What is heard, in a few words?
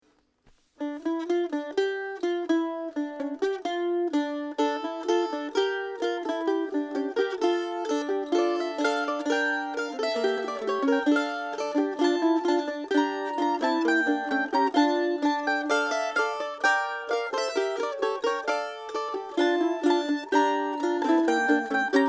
Mandolin A